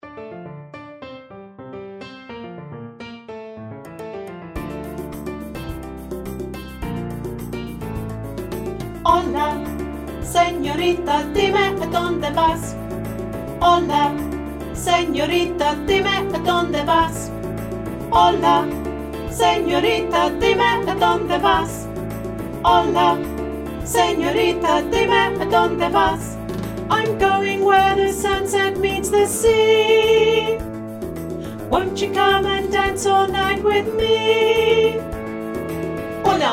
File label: Merengue; year 3 big sing